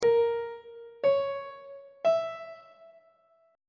a flat minor chord 2 is b flat diminished on treble clef